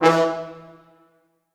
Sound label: E2 L, Orchestral Brass; Best Service ProSamples vol.20; AKAI